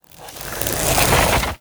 Plant Ice Plant Death Shrink; SFX Kit; Foley - Misc

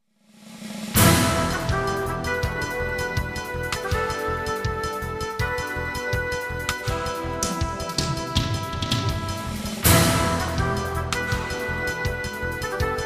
Jingles; 90 DDD Jingles

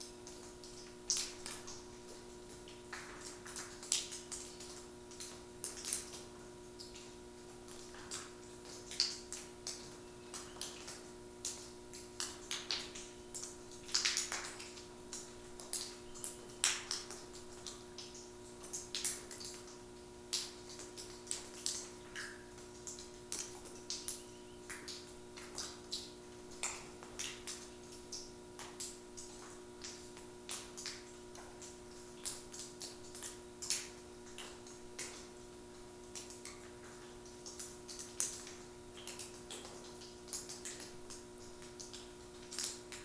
Urban underground water drips electric hum; urban and suburban; boom library

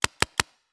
Paintball Gun; Sound Ideas; Guns

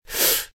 Breath; RadwinIsland

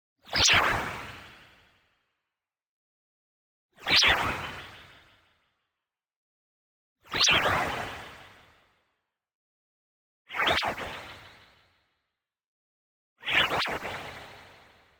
MOVEMENT FAST Washed Out; boom library; Anime Essentials; DSGNMisc

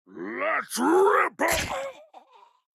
goon approuch; Blind Drive

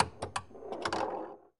Pinball Hit Metal Gate

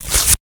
Index Card Tear; Office Supplies; 1001 Sound Effects

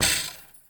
Street Ball Chain Rim, Street Basketball; SFX Kit

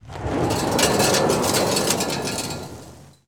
Auto Tires Peelout Gravel; Automobiles

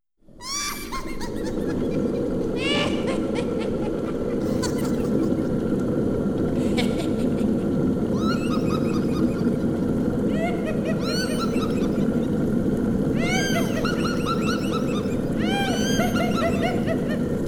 Wicked Witches; 1001 Sound Effects; Halloween Horror Sounds Effects

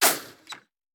Bullet Impact; Sound Ideas; Guns

